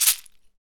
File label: SHEKE, Afro Shakers, Shekeres; Roland LCDP14 Africa VOL-2